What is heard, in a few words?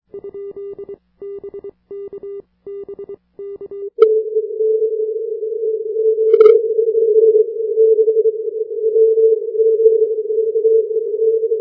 80m CW